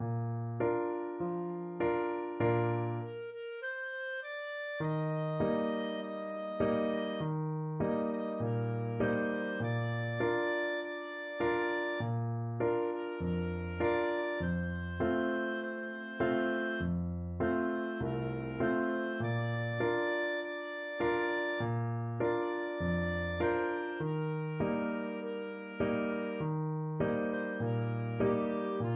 loving brother, clarinet

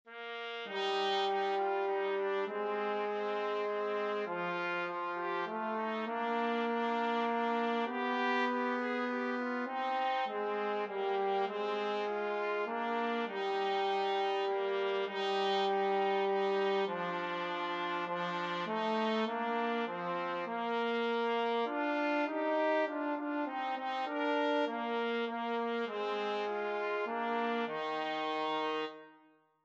we wish TPT TBNE, trumpet trombone duet

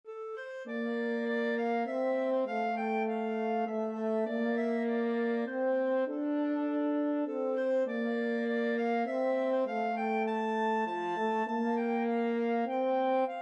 clarinet horn, clarinet-french horn duet

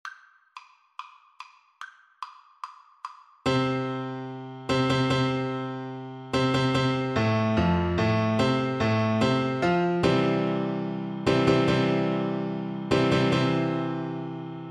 rossini william tell, Tuba

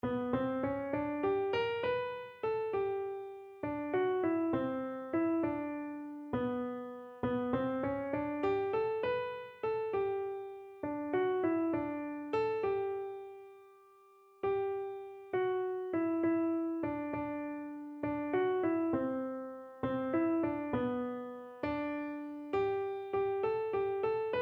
god will take care, keyboard

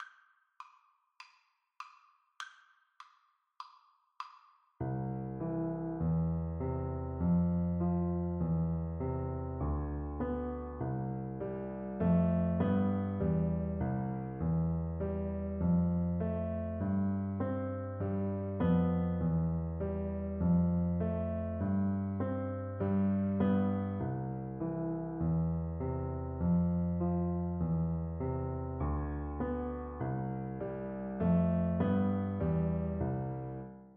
Piano Duet

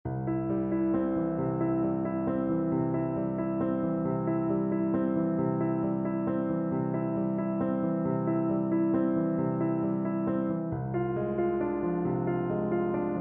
nocturne d flat, Flute; chopin op 27 2

